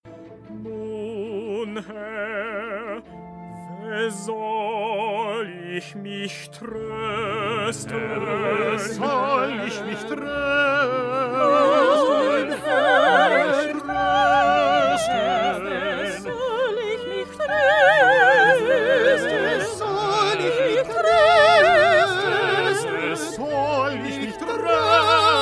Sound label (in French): Tutti; F–mesures 142 à 161